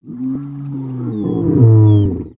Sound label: Lion Groan